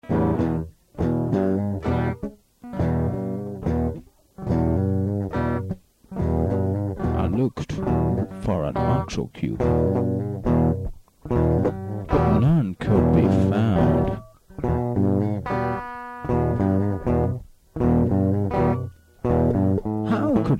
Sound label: blues; A live jammed blues (mmmmmm) set; This recording was made on Friday 3rd January 1992 on a test cassette